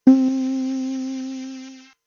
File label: Flute